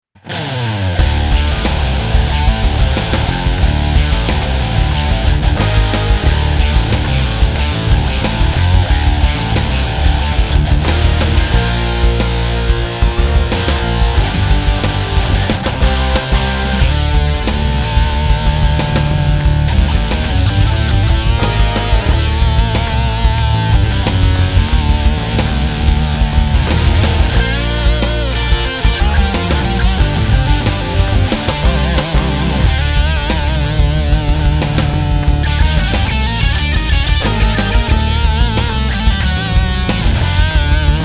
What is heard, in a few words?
metal amp